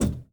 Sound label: Aircrafts Cockpits Throttle Lim; DCS